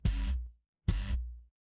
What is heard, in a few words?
feedback buzzer